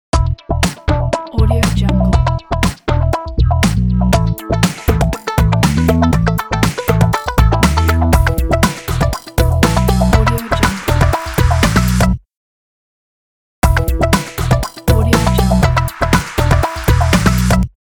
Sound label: 80s beat style logo